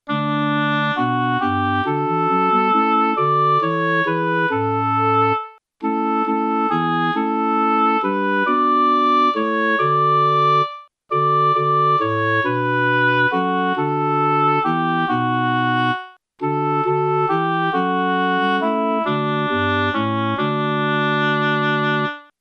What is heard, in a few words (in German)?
sopran; die helle sonn